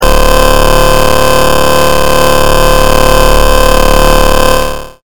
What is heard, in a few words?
machine